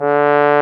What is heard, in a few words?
BRS BASS BO, Bs.Bone Solo; Roland LCDP06 Brass Sections; Bs.Trombones